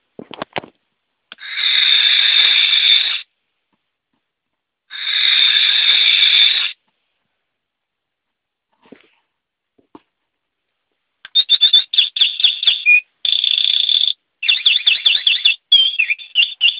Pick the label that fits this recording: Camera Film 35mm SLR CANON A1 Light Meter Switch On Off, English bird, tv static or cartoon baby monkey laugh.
English bird